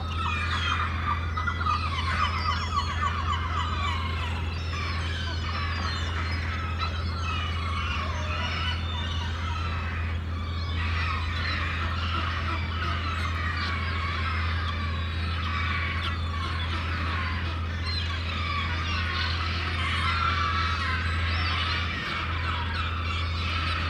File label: Crazy Harbor Seagulls, Sonic Seaside; Loop; The Sound Pack Tree